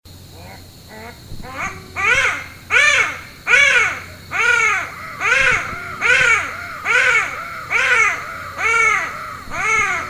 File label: greater galago, animal songs